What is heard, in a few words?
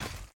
foot; smite; ahpuch skin10